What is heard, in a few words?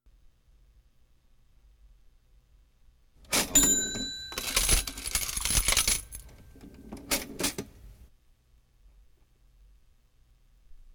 Cash Register Modern Type; Jac Holzman; Authentic Sound Effects Volume 2